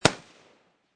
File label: M40 Sniper Rifle Barrel; Rifles; Guns; VRSonic